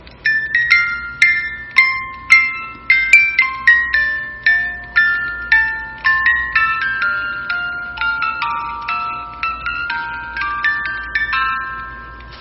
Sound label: Music Box; جعبه موزیکال باز شونده هندلی با طرح کاش